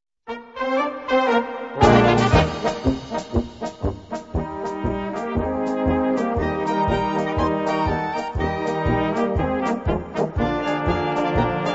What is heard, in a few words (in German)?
Polka